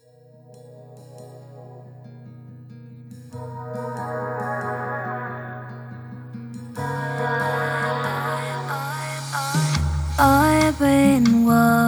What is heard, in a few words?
bossa nova remix